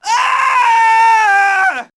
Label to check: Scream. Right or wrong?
Right